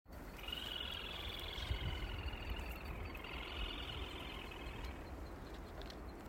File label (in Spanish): Burrito colorado; hudson